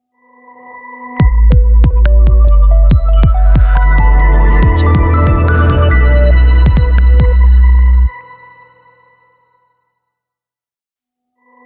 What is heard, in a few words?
abstract clean logo; matsteiner-music